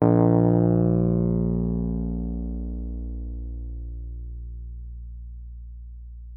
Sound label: WUF A#1, WURLITZER; Xsample VOL-1